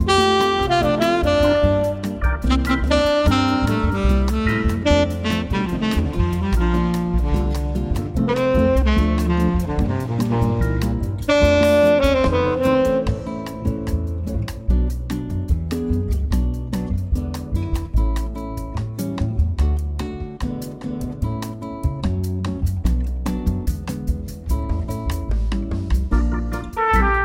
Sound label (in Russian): Fortepiano Dzhaz, Muzyka Restorany